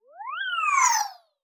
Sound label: Animation Cartoon; Multimedia Internet CD-Rom Flash